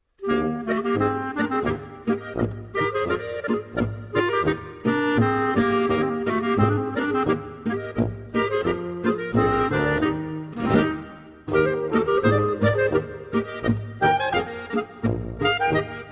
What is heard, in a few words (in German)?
Polka